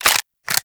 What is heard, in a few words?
Clip Ready, Weapons; Warframe; Seer